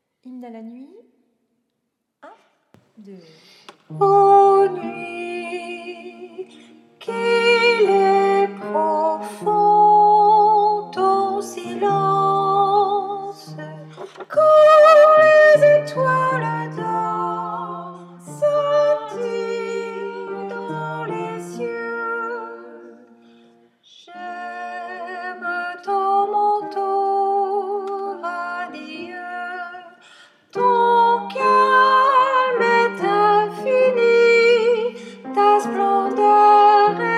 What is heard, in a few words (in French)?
hymne a la nuit soprane